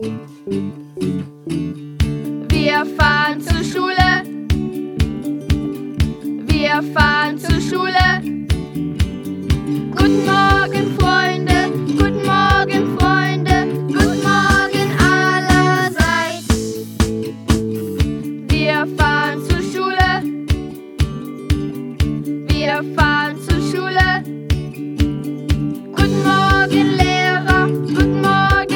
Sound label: song german